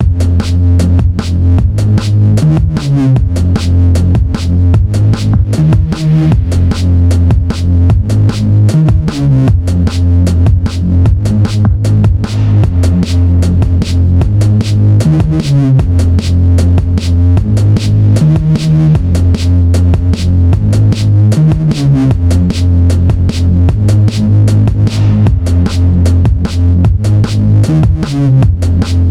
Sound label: slow jungle